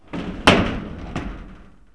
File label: stor clos, office equip; KABOOM! - Wild and Wacky Sound Software